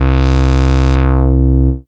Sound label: syn, Dance Synths, Wasps; ProSamples vol.37